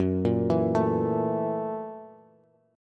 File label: F sharp 7th Sharp 9th, down, Bass; arpeggiated; JGuitar